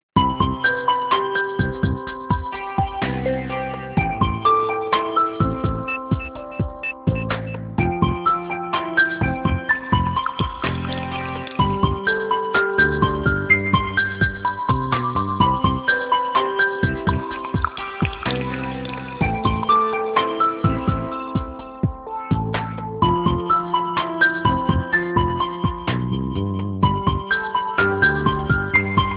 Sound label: MIDI; Okapia; Signature L